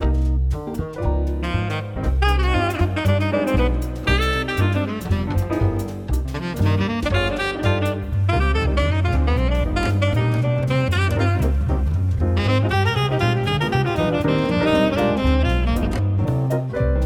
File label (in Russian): Музыка для чтения Чувство